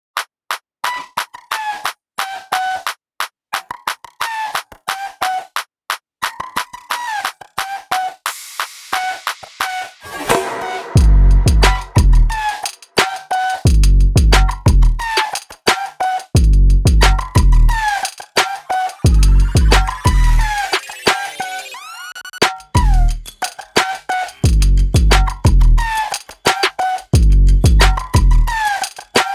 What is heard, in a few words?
Waka Underscore, Urban; Narrative Mind